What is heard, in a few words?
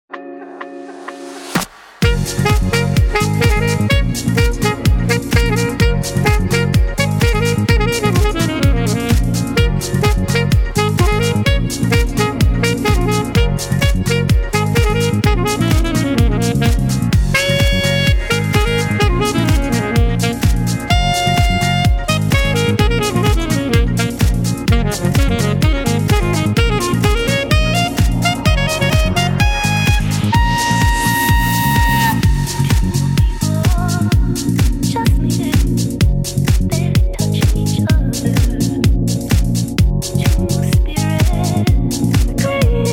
Clubbing mix